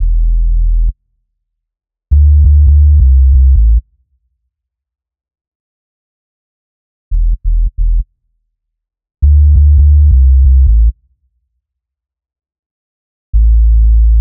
Bpm135 Bass Loop Am; Helios; Prime Loops; Dubstep Illusions

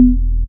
Synth Shot E; Mutekki Media; Vengeance Electroshock Vol.2

